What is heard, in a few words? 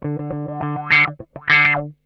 OUCH, Funk Guitar; Zero G - Funk Guitar